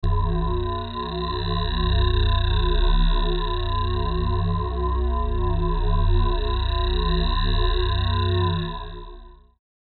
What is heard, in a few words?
drone